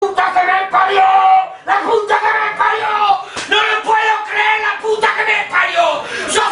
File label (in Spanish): cantado